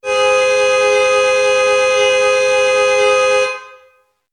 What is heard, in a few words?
car horn